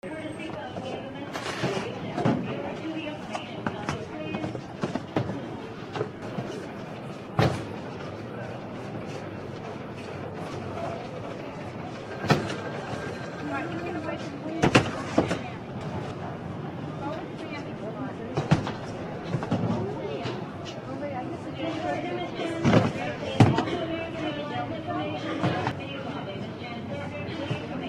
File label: atmo airport baggage claim area